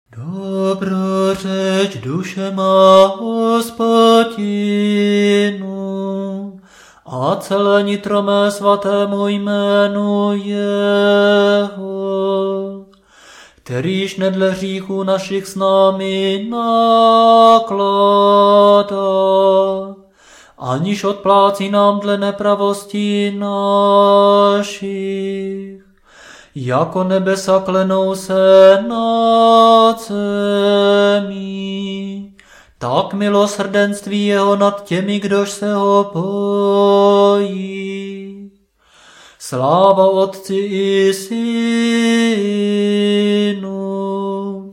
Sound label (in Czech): Antifona podle 4 hlas